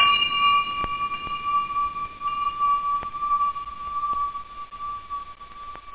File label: ting; da capo vol1; drums nature